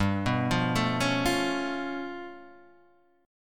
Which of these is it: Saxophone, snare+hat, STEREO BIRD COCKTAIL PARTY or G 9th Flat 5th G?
G 9th Flat 5th G